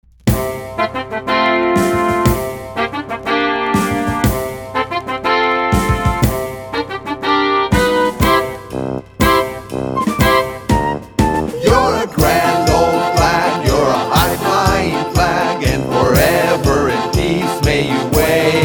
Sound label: american symbols reprise vocals